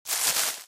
Unwrap, Food; DynamicEuropa; Hungry Europans

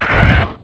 attack; Barotraumatic; Cthulhu; InsanityMonsters; Hallucination2; Mudraptor

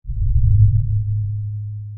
sonar Ping Air Far Beacon; Barotrauma; Real Sonar

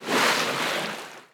Splash, Water; Barotrauma; Subsurface

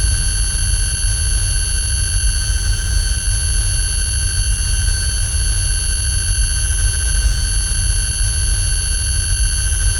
tinnitus; Barotrauma; Real Sonar

